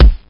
fist hit; dn3d r

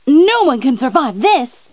FEMALE MELEE ATTACK; combatarms2

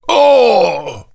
hit

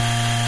laser minigun charge loop; custom weapons; cso

